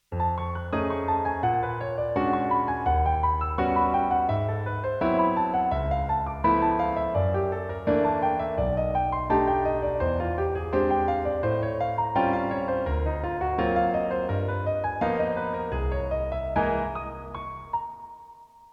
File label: Altered Scale Study; jazz piano